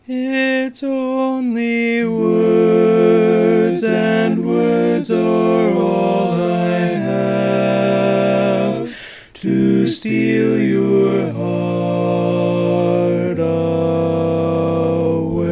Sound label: All Parts, barbershop